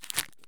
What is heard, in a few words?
item slippers open; Escape from Tarkov